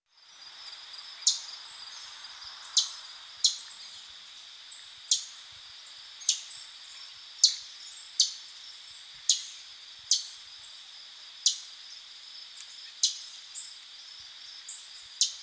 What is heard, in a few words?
Canada Warbler, Cardellina canadensis; warblers